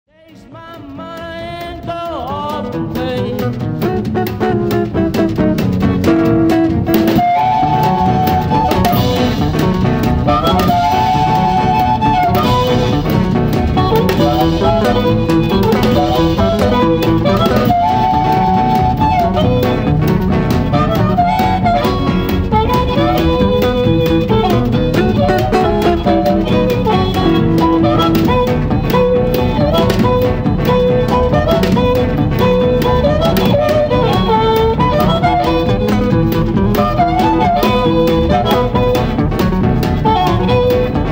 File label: Hohner Marine Band